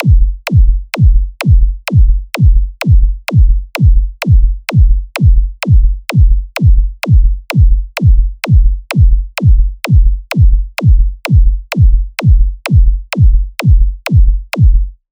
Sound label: Beat Robot Walk 128 bpm